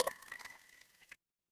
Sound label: water drip